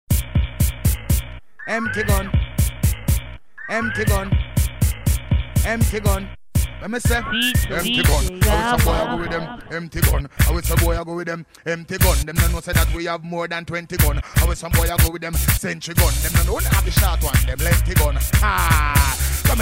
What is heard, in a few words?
dancehall rock riddim